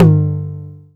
Jomox Tom; techno drum fx; Analogue DrumHits

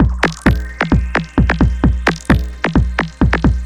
Beat (130BPM), techno; techno drum fx

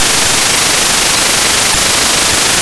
YM Noise; NOISES; ChipShop Deluxe; ChipShop Hits

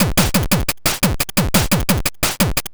PO Drums, 175 BPM; loops; CHIPSHOP DELUXE

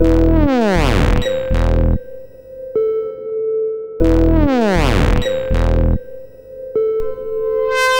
Synth E, 120 BPM; CHIPSHOP DELUXE; CHIPSHOP LOOPS

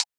Closed hat, techno drum

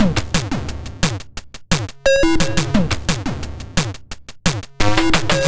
Combo C#m, 175 BPM; loops; CHIPSHOP DELUXE

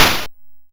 Snare, YM, CHIPSHOP HITS DRUMS; ChipShop Deluxe

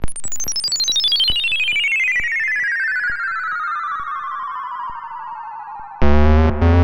FX Gm, 140 BPM; loops; CHIPSHOP DELUXE

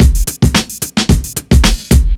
Beat 110bpm; musicradar

